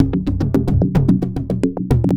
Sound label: 808 toms; Sonic Foundry (Sony Creative Software); Ugly Remnants VOL-2